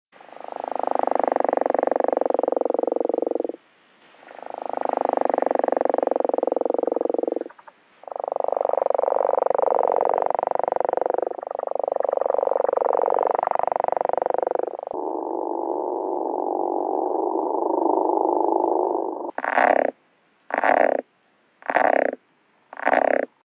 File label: Gopher Frog; WW Knapp